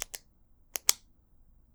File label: Pen Cap Clicks; bb - Books, Paper, Writing